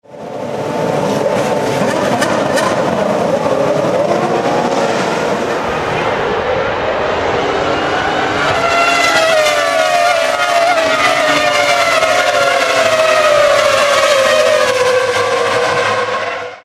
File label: Start GP Brasil